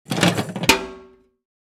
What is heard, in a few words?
Tactical gear box open